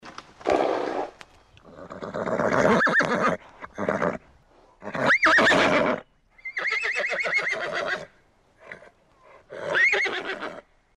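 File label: sound of a horse neighing and snorting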